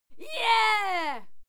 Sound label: female cheer yeah throaty; schreie; menschen